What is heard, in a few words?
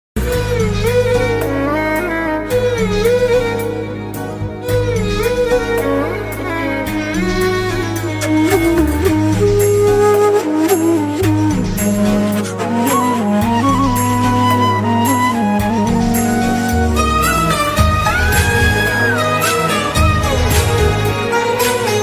bgm ringtone tamil ringtones instrumental ringtone